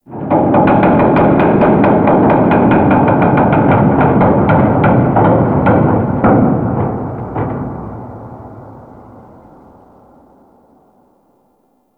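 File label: Ice Metal Distant, Ambience; Warframe; Corpus Ice Planet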